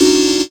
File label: Dirty Organ; Zero-G Jungle Warfare 1, 2 & 3